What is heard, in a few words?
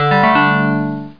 Clav Swash; Planet